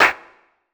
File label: Percussion, House Drum One-Shots